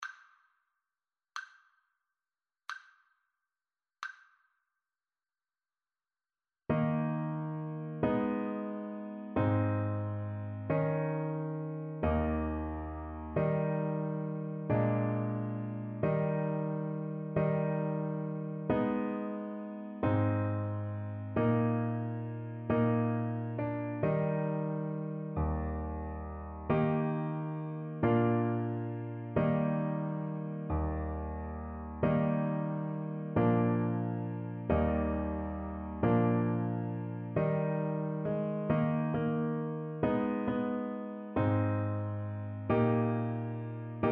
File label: romania joc in patru, Trumpet, Romanian Folk Song